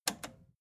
record player repeat click slow

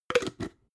jar glass plastic close cap

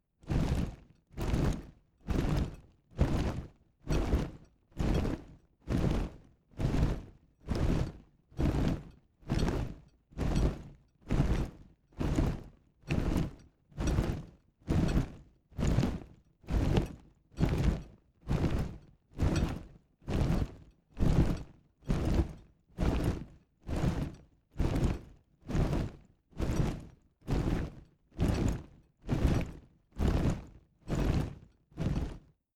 troops leather rustle march slow

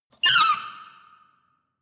window screech reverberant fast

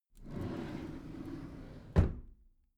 packed drawer smooth close soft

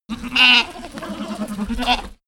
goat baaing goats

